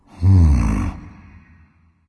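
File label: thinking, nonverbal; smite; voicepack; khepri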